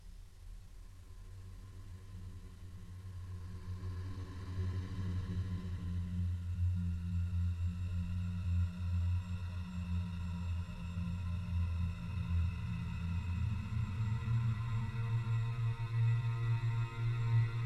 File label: spooky ambience